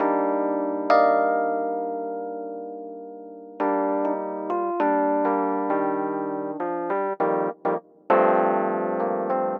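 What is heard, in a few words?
100 Pop Mark II C major, E-Piano; Pop Rock; E-PianoClav; Pro Tools Essential Loops; Digidesign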